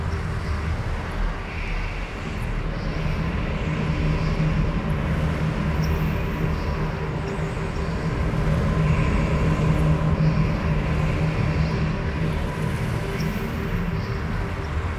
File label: rift idle; astral planes; algol